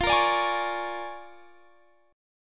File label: Bb 7th Sharp 9th F